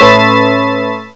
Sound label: SYNBELL; BELLS; M8; CMI Factory